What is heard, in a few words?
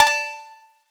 count up end; netent